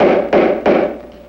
hammering

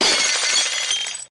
glass shatter

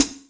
Hats Real Closed

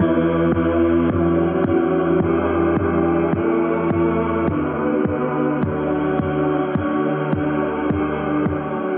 bass, Loops, Sad

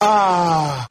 "ah", Voice

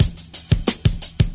fade in slaps, Boombap drum loops

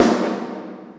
Snares Real

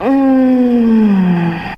"mmm" female, Voice; ooh ahh

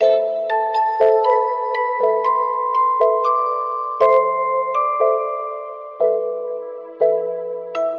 keys music box keys, Sad Loops

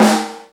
blender, snares real

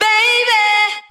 "baby" SUNG; Voice; ooh ahh

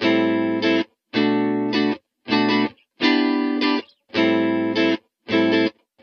guitar, Loops, Phonky

